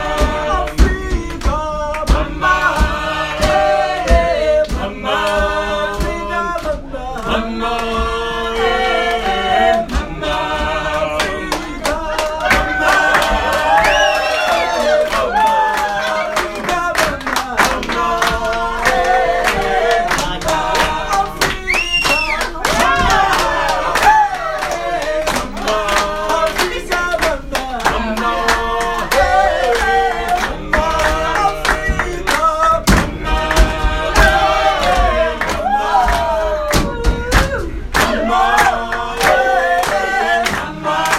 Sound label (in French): Chant Zimbabwe